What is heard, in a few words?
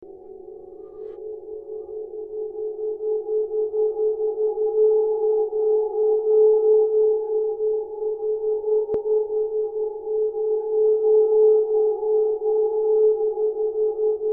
gong